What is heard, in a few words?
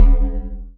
dark sync thump; pro5